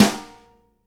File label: snare NYLON, Live Snares; E-MU Producer Series Vol. 8 – Platinum Phatt (CD 1)